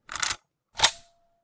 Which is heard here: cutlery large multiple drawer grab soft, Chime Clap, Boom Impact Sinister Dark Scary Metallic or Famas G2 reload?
Famas G2 reload